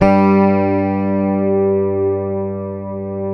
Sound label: FINGERED, Bass; G2; Sampleheads; Will Lee Bass Library